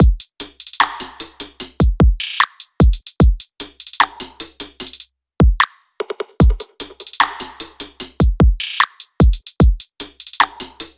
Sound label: drum loop 75, Pop; Drums; Full Drum Loops; skycrest; Slate; SD PASADENA Pop Sample Pack